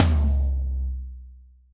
high floor tom single hit d key

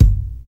old school bassy bass drum sample f sharp key

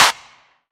clap single hit b key; percussion single hits one shots

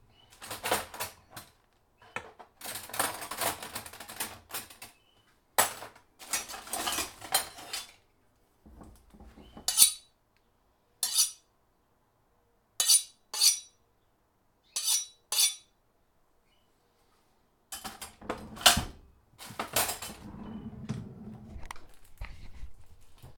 knive drawer sounds - sharpen sounds

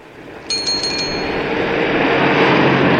BELL AND CAR PASSING BY

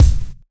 punchy kick single hit c key

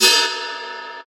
long crash a sharp key; cymbal drum samples

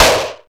present snare sound g sharp key; snare drum samples

